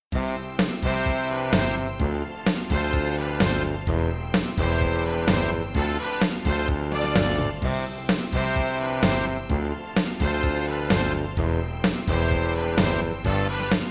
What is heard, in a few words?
Instrumental